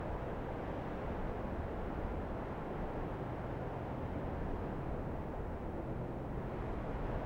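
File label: desert loop light wind; ambient; atmosphere; Counter Strike Model Pack; CS:GO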